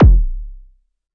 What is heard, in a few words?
Arturia MiniBrute kk